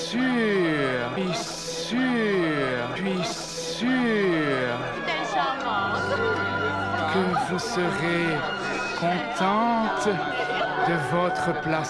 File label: Ambiance